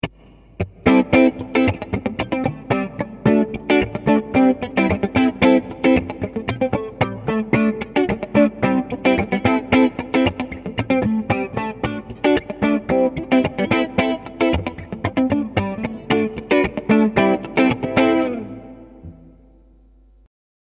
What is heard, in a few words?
Neck Clean